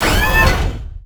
goob reload; Quake 4 Alpha; id software

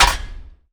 step; Quake 4 Alpha; strogg; valkaryne

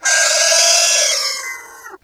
retch pain; Quake 4 Alpha

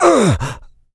pain light, player; DoomRTX

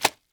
Zombie guts Wet Chamois; Doom; Zombies

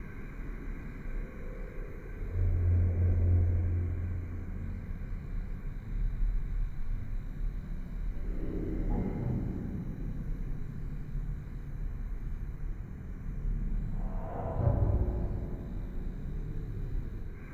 Hell Knight Amb LOOP Left

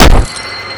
cgun shot; Doom

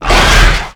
pinky attack melee, monsters vocalizations

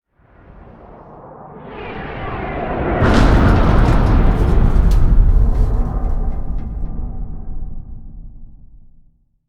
intro cin impact; Quake 4 Alpha; id software

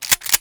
Shotgun Pump Action; Foley; DoomRTX

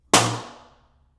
table foley; Doom

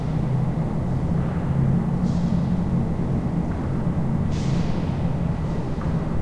bay ambience; walker bay; Quake 4 Alpha

